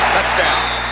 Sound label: Touchdown; HyperFootball